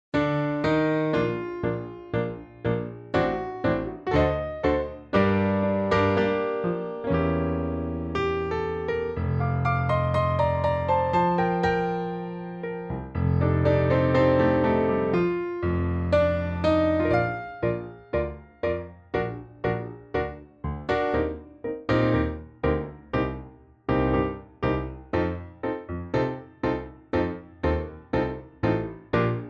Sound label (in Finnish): Tango Bb duuri